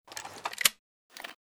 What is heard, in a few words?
rg6 draw